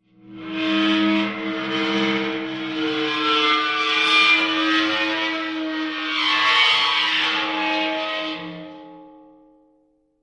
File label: Dry Ice Eerie Metal